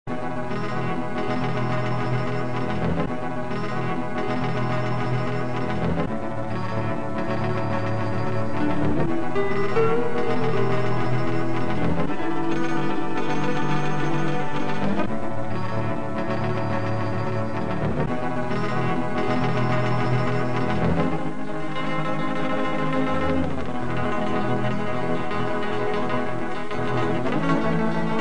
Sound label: Mandolin